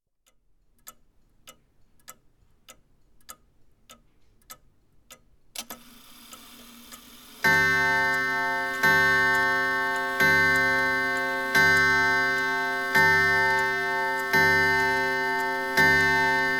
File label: Gustav Becker 22 O Clock